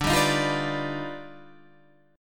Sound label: D sharp Augmented Major 9th D, chord